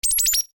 UI Confirm, User interface feedback sounds; Computers (Sound Effects:Electronics); SoundEffects-Foundation4

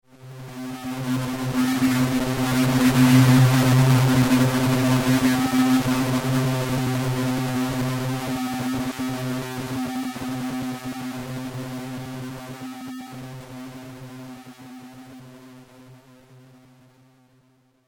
Death Machine Laser Chaos Drone

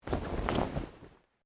Clothing Shirt Cotton Move

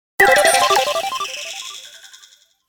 Hit Arcade Laser Click Delay; Production Element Imaging Element Accent Transition

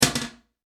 Household Toilet Lid Close, bathroom

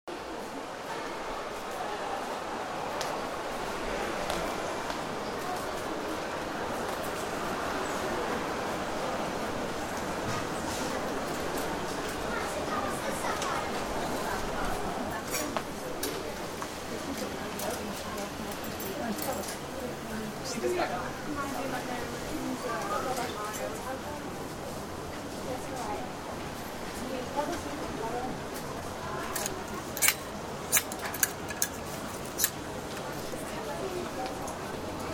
Clothes Store; Sound of shoppers browsing clothing at a department store; Stores and Malls

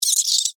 Insect Voice Chirp, Vocalization, Bug; Animal

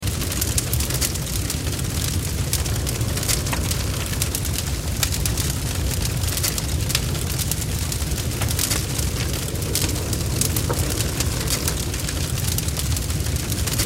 Fire Barn Interior Wood Large Crackle Heavy Loop; Flames; Flame Sound Effects

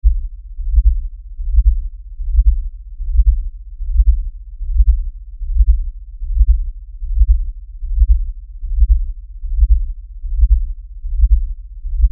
Lfe Pulse Low Bass; Drone; Sound Design

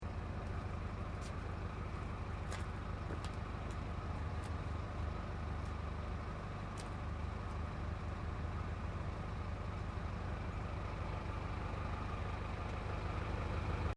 Tractor Earthmover Idles Distant; Tractors; Vehicles

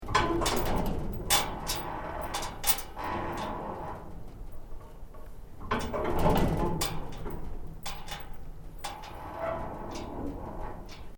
Metal Creak Soft; Material components to create more complex sounds; Materials

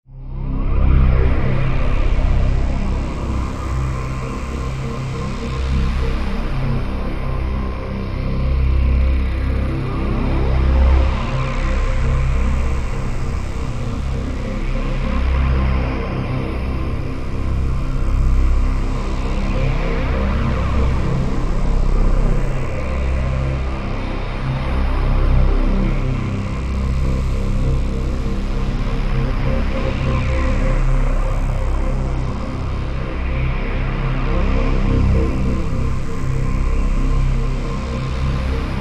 Synth, Stab, Drone, Horror, 5.1; Sound Effects:Science Fiction; BlastWave

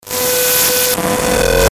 static electronic; Static Electronic Fizzle; Production Element Imaging Element Accent Transition; Blastwave

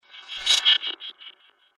Whoosh Warped Metal Delay; whoosh sound effect

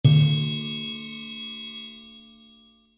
Percussion Triangle Tympani Hit; Hits; Musical; Blastwave

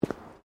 Footsteps womens low boots concrete step; Footstep Foley; Footsteps (Sound Effects:Human)

